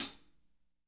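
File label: hat closed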